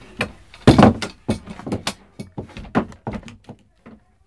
loquet

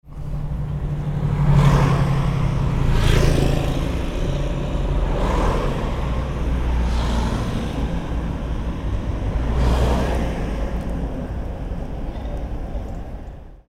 moto

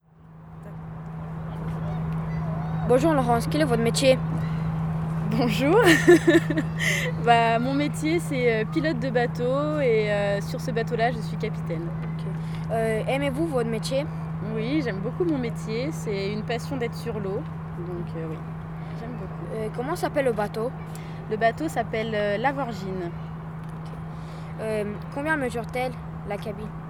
cabine capitaine

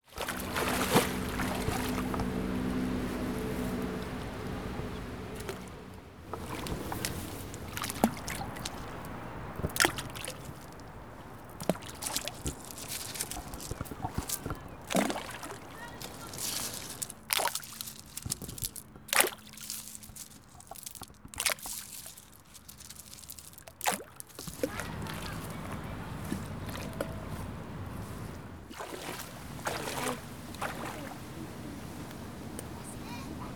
voix eau remuee bruits aquatiques; peniches

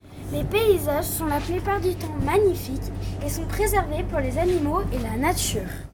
paysages, sainte foy

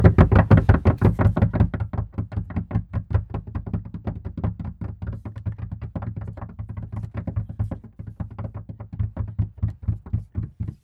Les tocs tocs

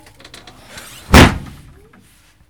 Le tiroir lit

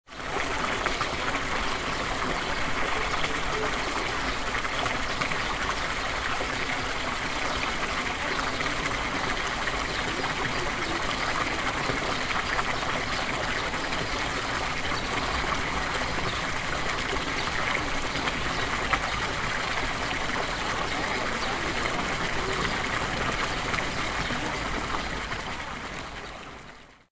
Fontaine du Palais Saint Pierre

